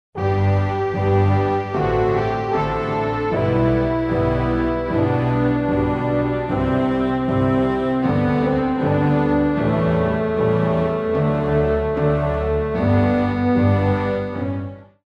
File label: classical